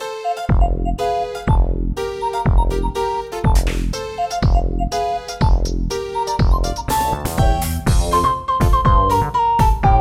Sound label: Beach Theme